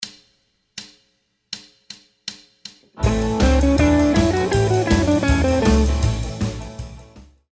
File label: soloing